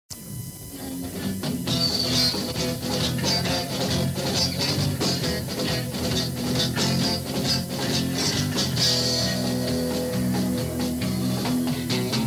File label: spanish gypsy dance